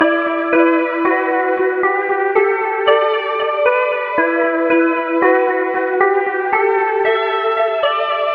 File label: 115 E Seq; Summer Drift; Zero-G - Future Wave